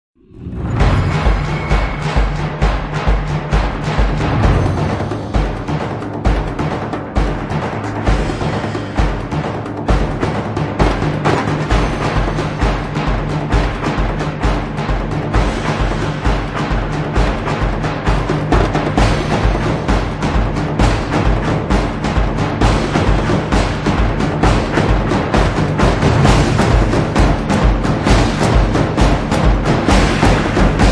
Drums Only Version

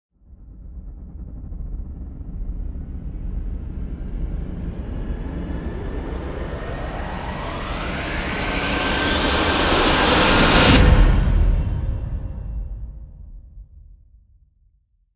Riser; Gothic Storm Music

